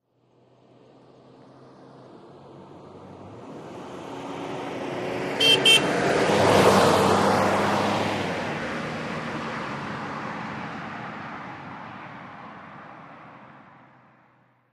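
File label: Montero By Fast Horn, 1998 mitsubishi montero ext.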